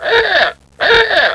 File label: enslave warning